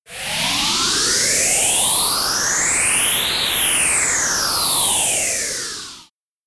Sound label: whoosh; Whooshes and sweeps